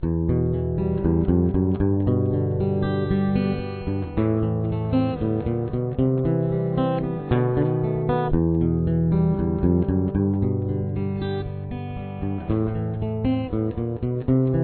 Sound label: Guitar 1, chorus